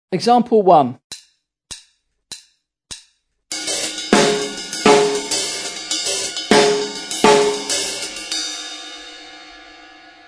drum set

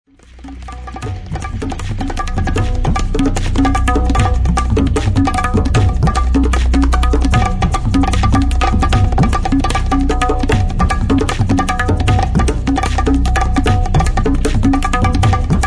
taiko tabla

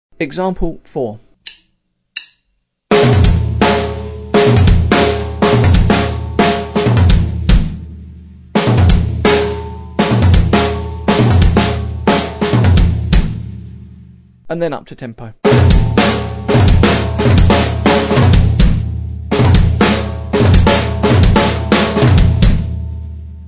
drum set

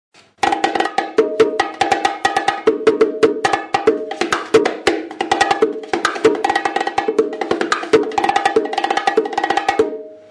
bongo; PETE LOCKETT

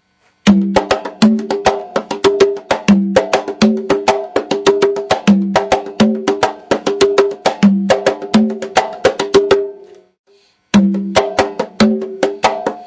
pop conga

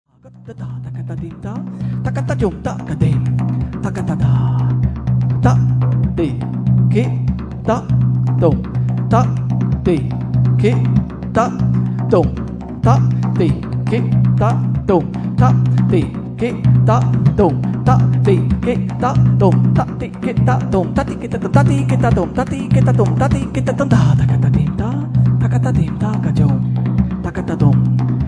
drum live india; pete

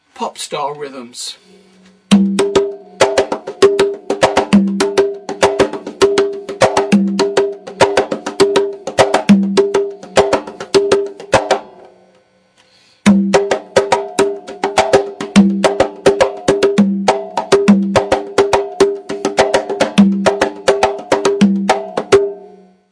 pop conga

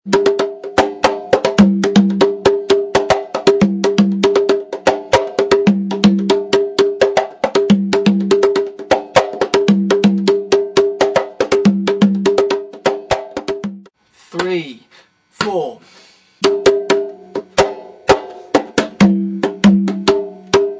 conga, mozambique style

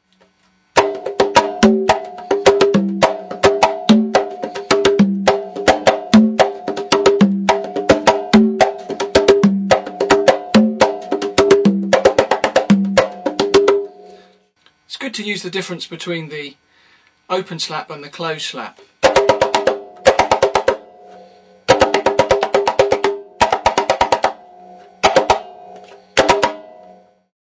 pop conga

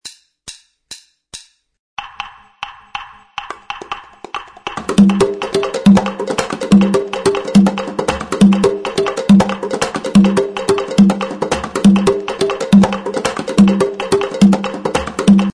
conga, rhumba mix

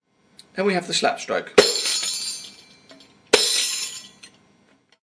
req slap stroke

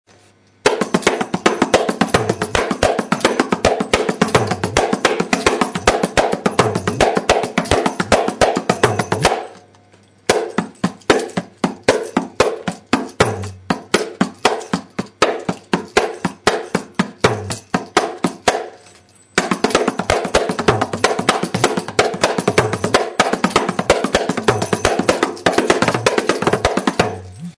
kanjira accent

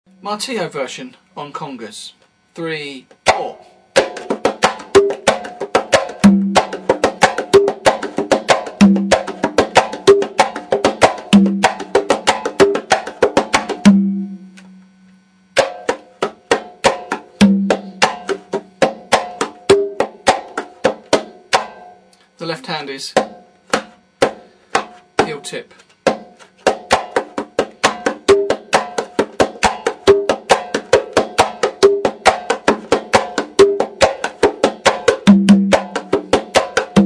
martillo congas

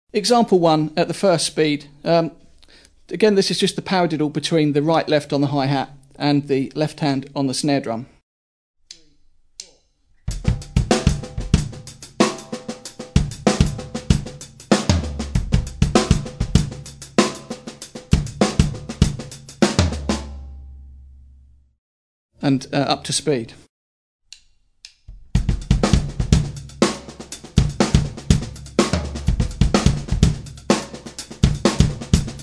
drum set; Independence day 09

